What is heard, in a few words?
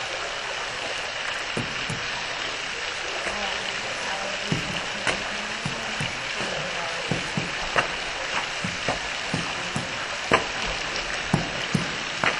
booms; ash cave 2013